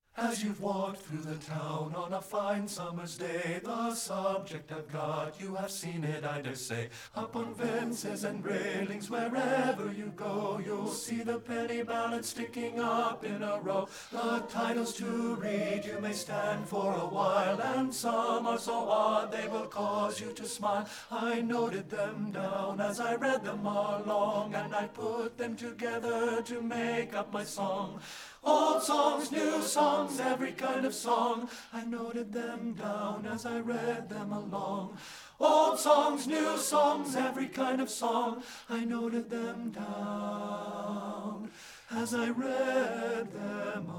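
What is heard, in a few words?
madrigals, tenor; STEPHEN FOSTER MADRIGALS 2; partbypart; satb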